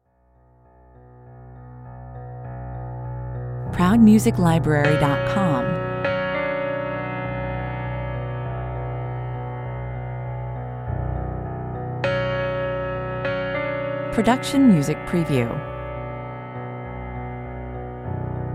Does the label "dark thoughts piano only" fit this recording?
Yes